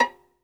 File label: SOLO VI, Synclavier Strings; ILIO